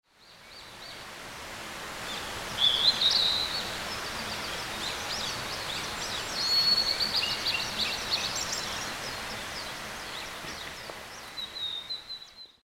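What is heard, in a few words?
Blue-and-white Flycatcher; hinohara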